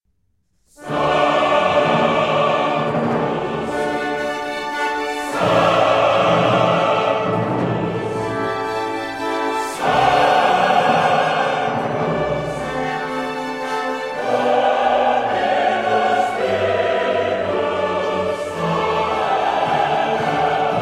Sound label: sanctus (chorus)